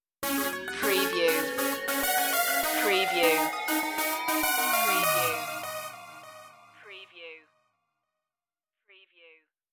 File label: deep impacter stinger